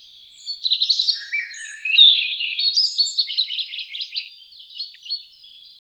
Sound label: birds singing and gentle; ambiance